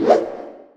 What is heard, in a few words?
preattack arc; weapons; dota; razor